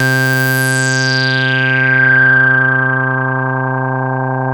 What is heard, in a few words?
MOOG, BASSES; Masterbits - Vintage Voltage; VV BASSES